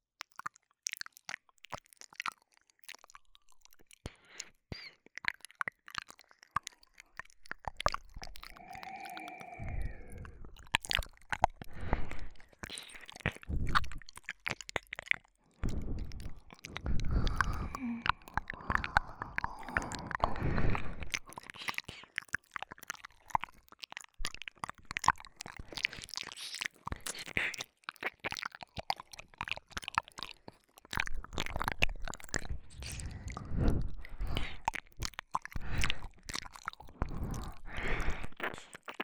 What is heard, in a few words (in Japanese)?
W耳舐め, ASMR